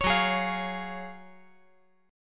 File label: Gb Major 7th Suspended 2nd